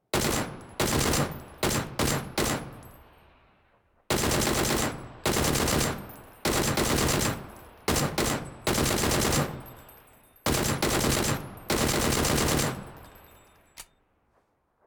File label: DP28 shots